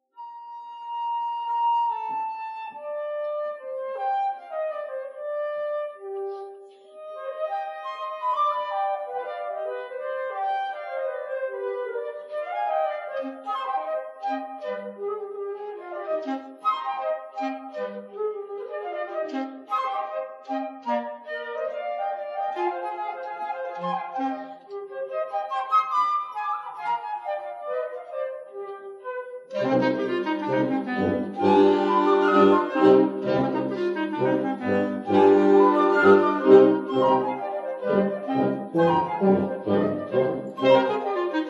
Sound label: Celtic Flutes